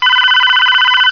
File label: Telephone Ring